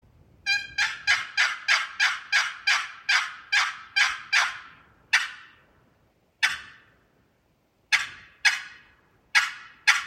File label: Granadillo and Cherry, turkey calls; Granadillo over Cherry